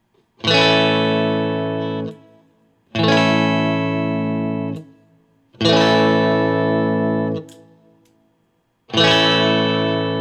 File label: Guild Liberator Elite Boosted